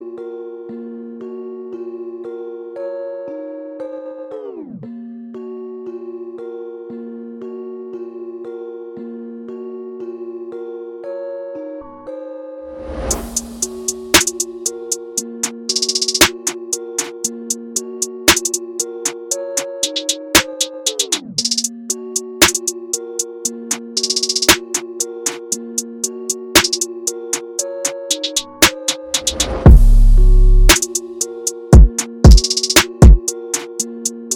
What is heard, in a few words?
trap beats